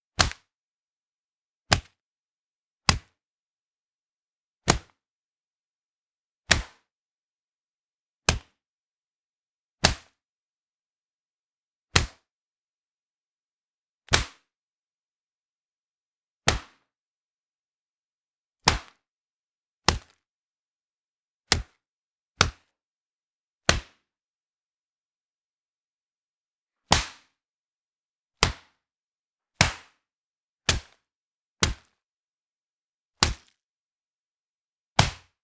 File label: Brutal Punches